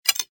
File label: general click